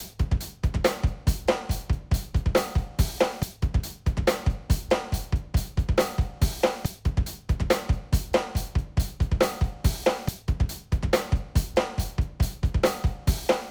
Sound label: rock